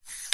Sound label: technology mixing desk fader slide; zapsplat